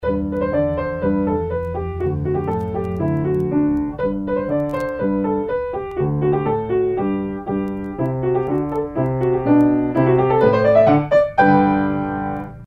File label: Pianist; Bastien